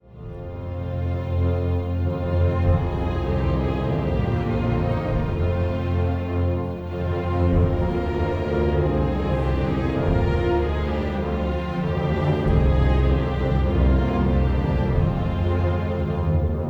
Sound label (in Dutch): Fanfare; voor Israel